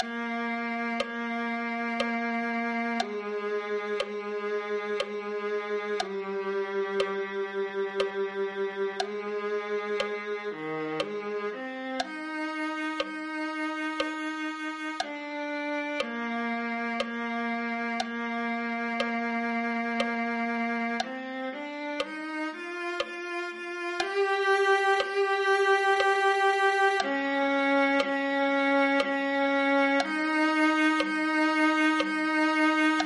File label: viola